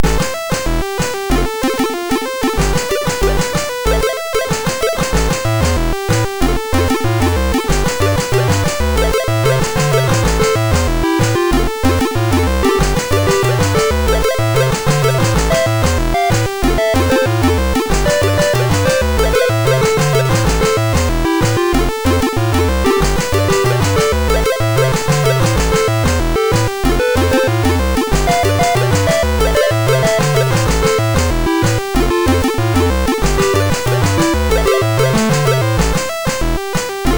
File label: remix MOS6581R2